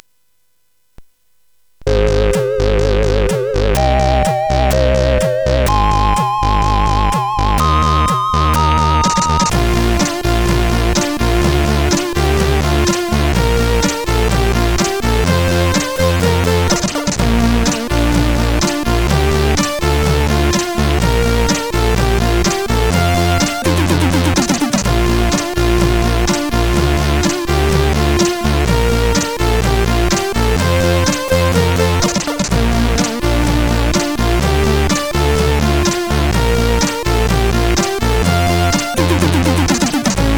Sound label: Intro, CSG8580R5; X-ample; hvsc